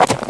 Centaur Hooves; Playable Tutorial Level; TR1

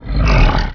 Bird Monster Gasp; Playable Tutorial Level; TR2

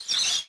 Pole Squeak; Lara; Playable Tutorial Level

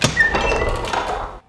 Small Door Subway; Playable Tutorial Level; TR3

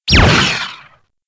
Laser; Sword God

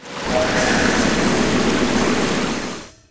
Reservoir Flush; Playable Tutorial Level; TR3

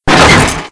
Crane End; Playable Tutorial Level

